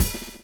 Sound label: RIDE, RIDING; AMG Gota Yashiki Groove Activator